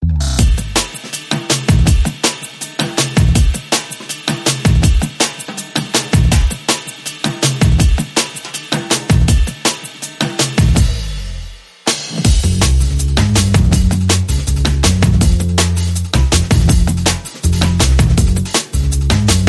Nu Drum Old Bass Rhythm

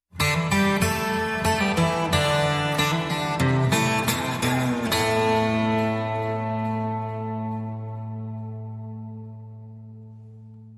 Dustbowl Rattle A minor, Guitar Only

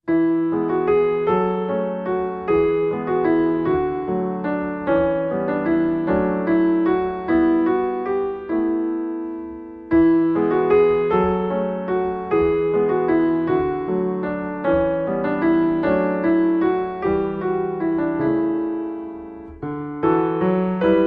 Kind Waltz Full

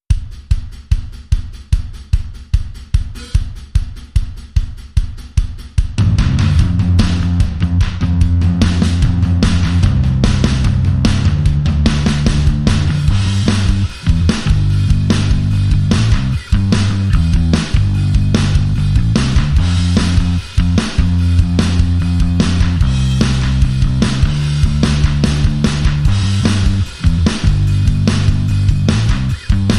Drums and Bass Only

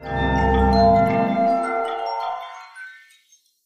Chime Sweep

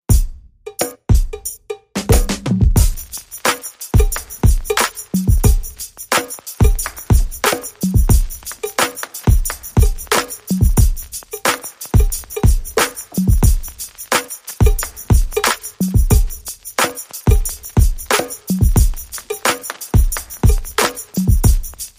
Sonic Beat

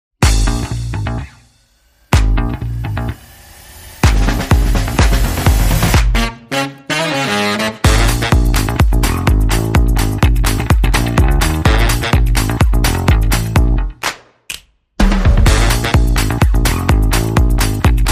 Light Percussion

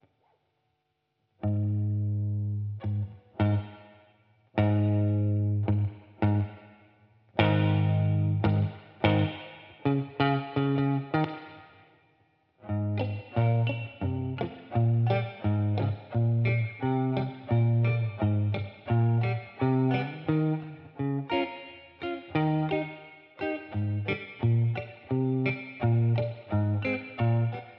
Guitar

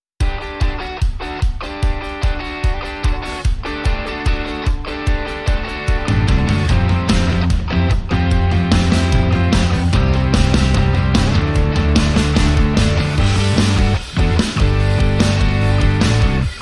No Percussion Reduced Arrangement

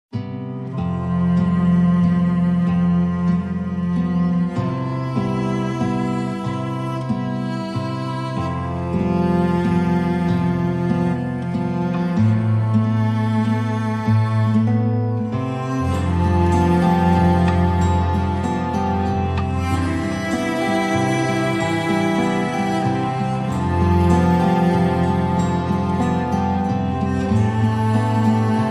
No Bass

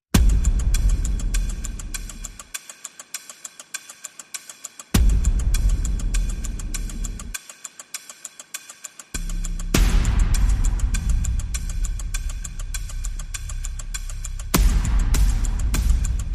Percussion Ticks and Hits

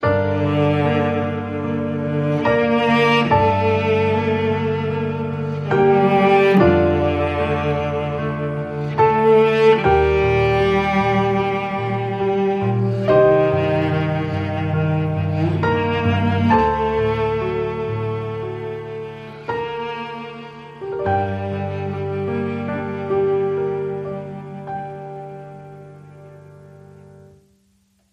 Intimate

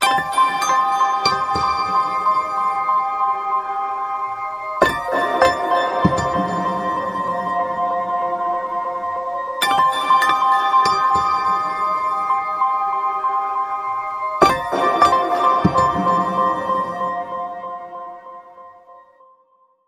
Whimsical Bell Pulse

